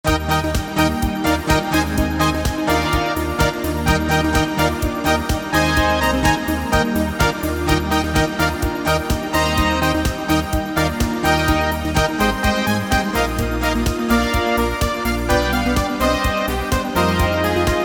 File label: Rock Flourish